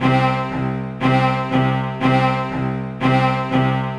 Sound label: 120 C string, String Loops, Trance; Trance Explosion Vol1